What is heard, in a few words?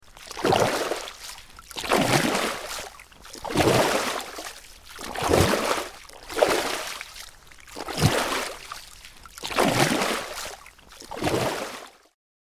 Swimming in pool breaststroke